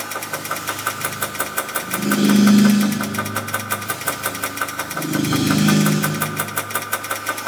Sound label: Moon Clock Machine Loop; Warframe; Ambience; Orokin